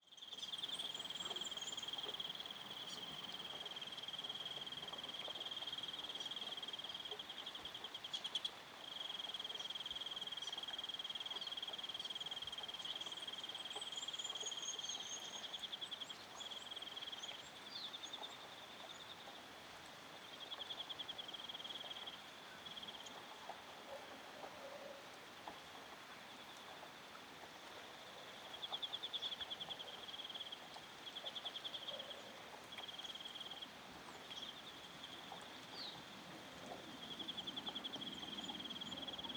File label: New-Zealand-Waitomo-birds-rain; North Island Ambience; The Sound Keeper